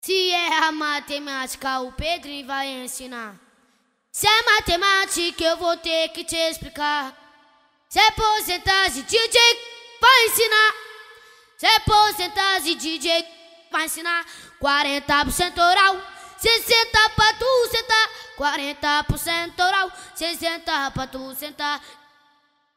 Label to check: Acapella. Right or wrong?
Right